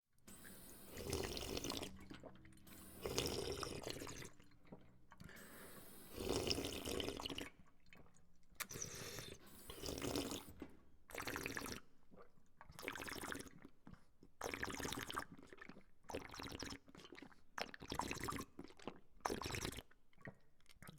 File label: drinking from water fountain